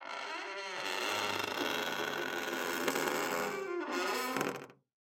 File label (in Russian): sunduk otkryivaetsya sam jut